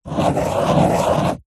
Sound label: office pen ballpoint paper hollow table draw circle; zapsplat